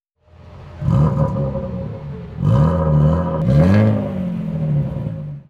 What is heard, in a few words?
Rev no pink